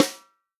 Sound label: snare drum, POP SNARE CHROMATIC; Best Service ProSamples vol.43 - Real Drum Kits